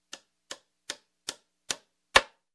Hammering a nail

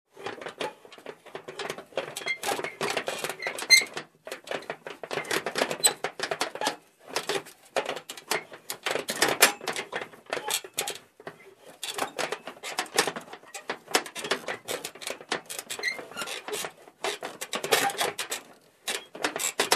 Rattling old metal

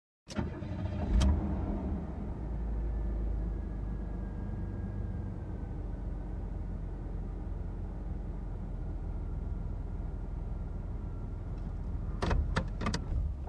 Car Ride

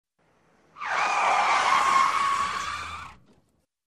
Car Drift

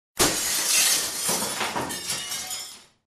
Glass Shattering

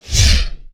Upclose zooming sound

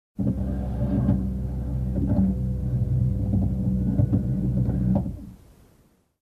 Noise from outside the car

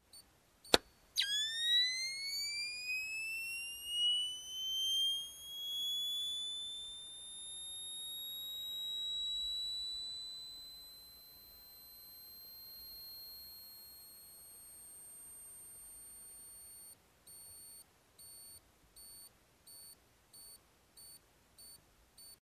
Projector Turned On with fading beep

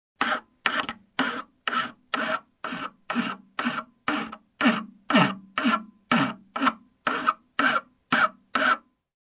Hacksaw in action

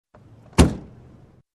Truck Door Close

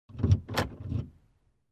Compartment in Car interior

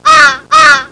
Raven double caw

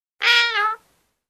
Guineafowls

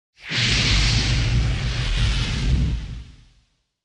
Fire light up long whoosh

